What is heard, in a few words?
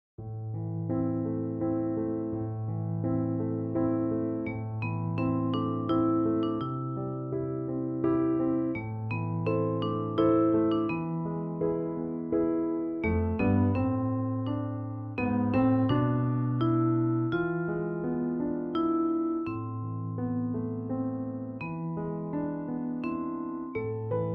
Piano Vibes Vocaline; Les 1 Oefening 30